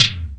TOM MID, Big and Heavy Real Drum Kit; REAL LIVE KITS

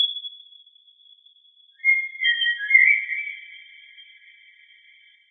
atmospheric bird; Zoo and Nature

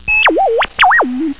computer

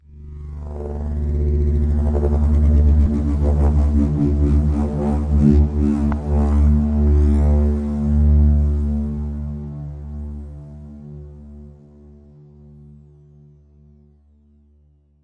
approaching spindown synth

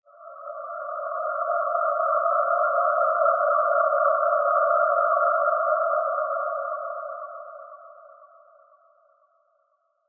alien monolith pad; Pads

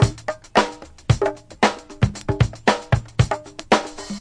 114 flamd conga rock; 114 bpm; 111 to 120 bpm

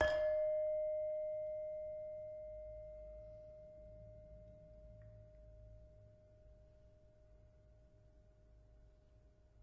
Saron, Indonesian Saron (Multisampled); WORLD

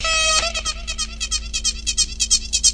mosquito delay; FILLS and ROLLS

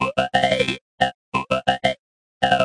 vowel beat; VOCAL OR VOXY LOOPS

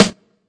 classic hh Snr, Classic Hip Hop; STYLE KITS (Hip Hop, Etc); Classic Hip Hop Mega Kit

